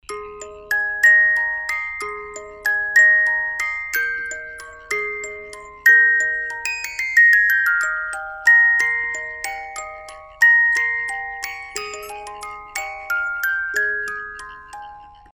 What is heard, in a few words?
Merry Widow Waltz, musicbox